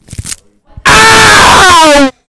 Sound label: crunch owwwww